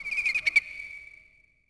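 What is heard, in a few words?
Japanese small bird; cs hokkaido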